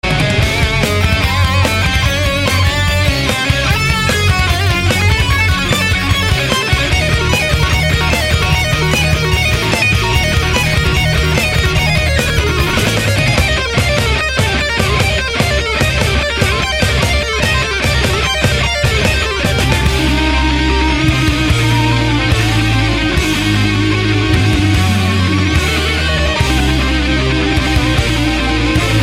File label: solo